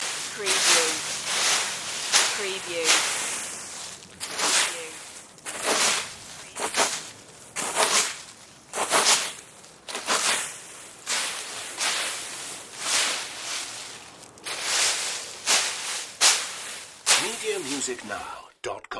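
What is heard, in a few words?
Raking Leaves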